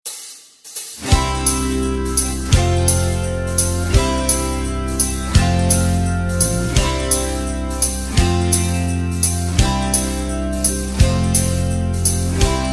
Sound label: (C) No Backing Vocals